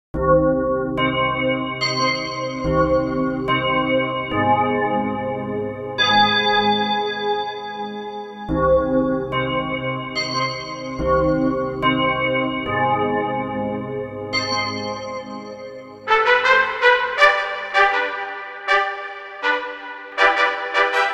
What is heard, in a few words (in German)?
Glocken Trompeten Fanfare